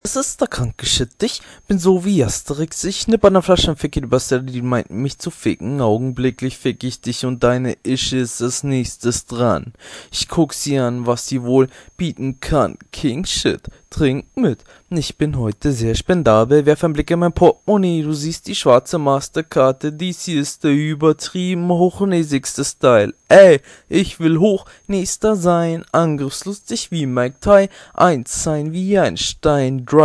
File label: german hip hop